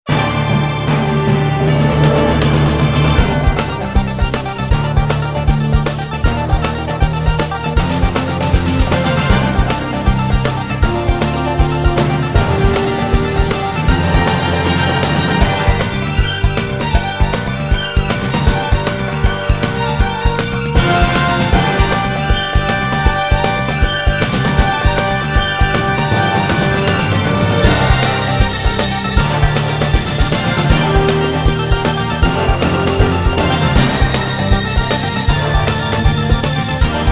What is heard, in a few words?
Orch Elec Action Sports Adventure